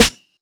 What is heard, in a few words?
Snares